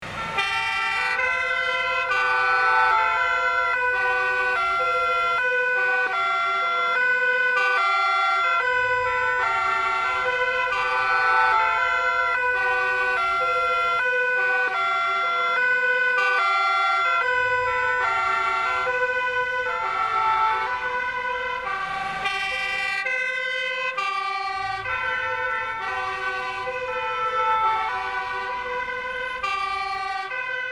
Emergency vehicles multiple sirens passing by city traffic